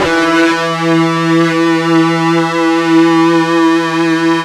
FUZZ E 2, Guitar; East West; Steve Stevens Guitar Samples Collection

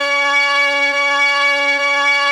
organ F, PIPE ORGAN; Best Service ProSamples vol.55 - Retro Sampler; AKAI

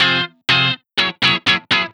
Guitar Stabs; Trip-Hop; Sonic Foundry (Sony Creative Software); Rondo Brothers Trip-Hop Loops & Samples

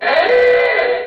Horn, cartoon Foley Fx; musicradar; cartoon caper

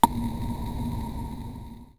Snare, Korg Z1

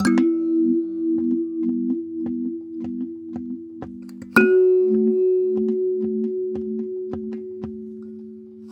Kalimba, African

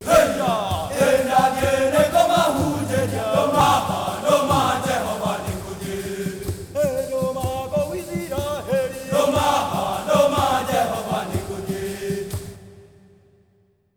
Heart of Africa; Spectrasonics; Heart of Africa 2

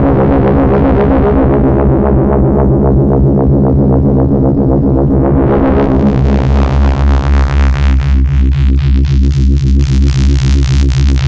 Evil Bass, Drum n Bass; KJ Sawka; Drum n Bass & Beyond

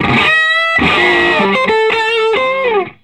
Vintage Blues Guitar, SOLO; USB Soundscan vol.22; AKAI

